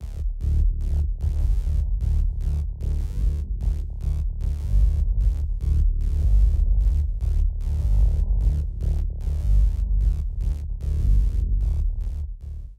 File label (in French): synthe saw bass exposed